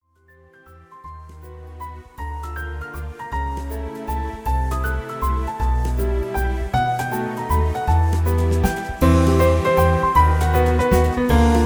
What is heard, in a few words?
Chopin Waltz